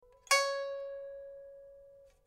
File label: pipa; chuYinKouXian